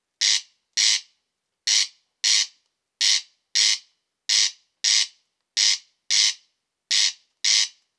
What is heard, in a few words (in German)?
Wachtelkoenig Ruf